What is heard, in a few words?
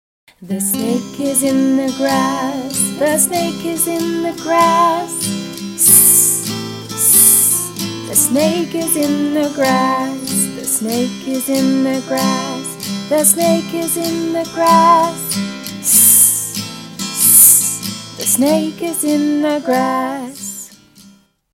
S song harmony